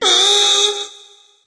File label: "ooh" SUNG; Voice